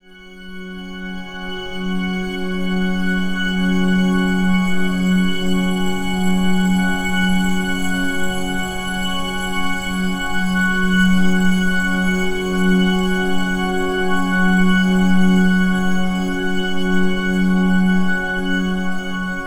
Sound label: Sunrise F#, Ambient Pads; Zero-G Seismic Frequencies; 90 s Sample CDs